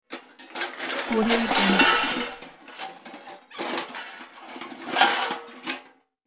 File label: search through junk pile; hollywoodedge